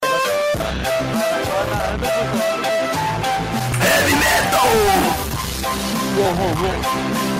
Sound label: heavy metal